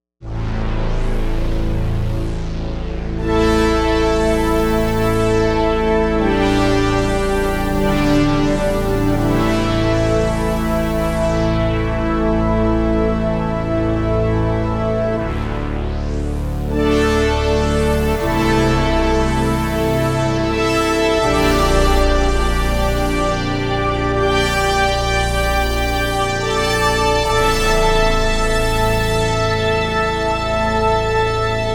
big mouth pad; Jupiter-80 v2

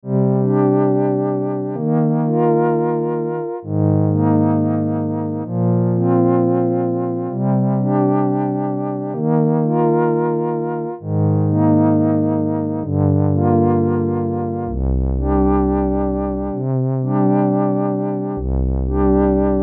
Flute

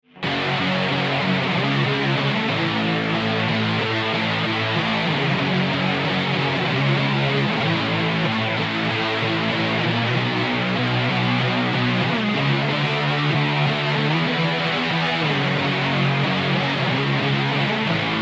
wall of fuzz sound

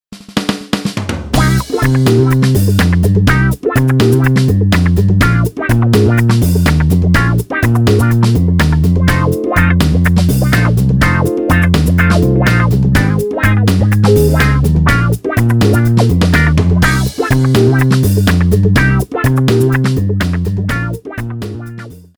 patch demo

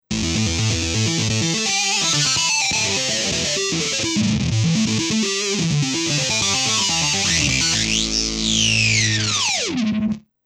sh-01 bank 1 patch 6

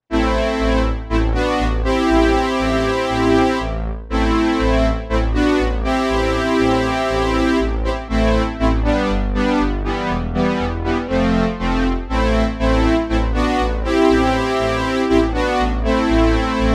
String

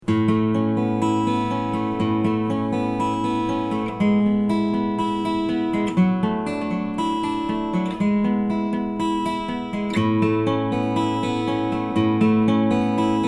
acoustic guitar

